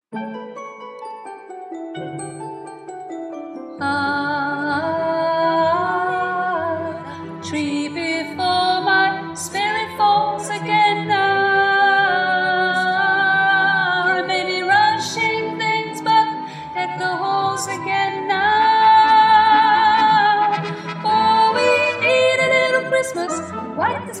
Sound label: ALTO